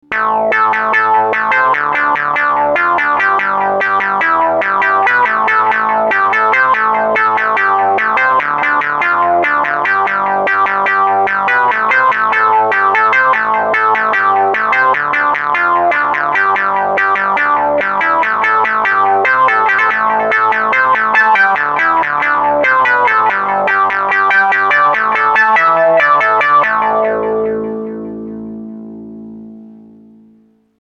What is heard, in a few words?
Mini Korg 700S Sweep